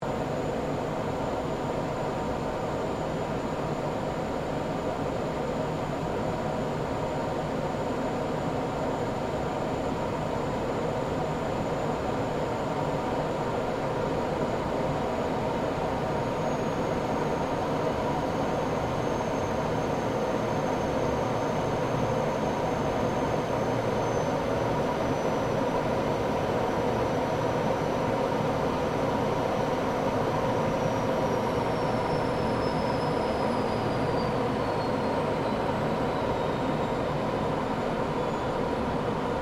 AIRCRAFT GLOSTER METEOR F MK8 FLYING INTERIOR; Gloster Meteor figter jet; Airplanes; Vehicles; Aircraft Collection; Twistedtracks

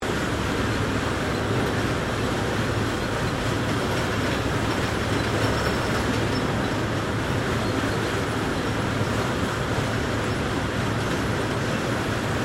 FACTORY BOTTLE MAKING AMBIENCE; General factory Ambience; Machinery; Machines

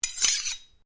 Blade Swish Low, Sword; Foley sounds for melee weapons; Swords (Sound Effects:Combat)

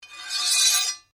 Blade Scrape Medium, Sword; Foley sounds for melee weapons; Swords (Sound Effects:Combat)

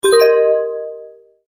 multimedia tone advance

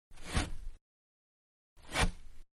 Tissue-Two Being Removed From Box; Paper; Materials; Manhattan Production Music

